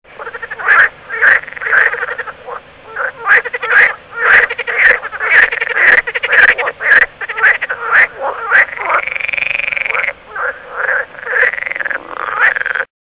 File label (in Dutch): meer groene kikker paringsroepen